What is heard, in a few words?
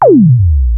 MG1 reso, Moog; Rubber Chicken Software Sample Bank